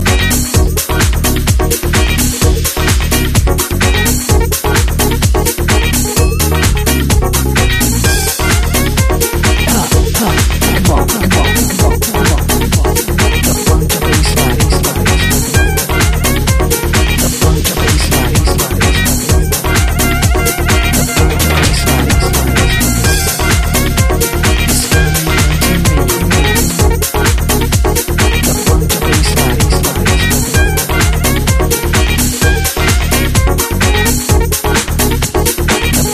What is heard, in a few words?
Funky Bass Line Funk